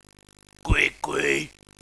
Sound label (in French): cuicui